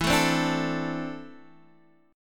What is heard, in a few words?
E 7th Suspended 4th Sharp 5th, Guitar, DADGAD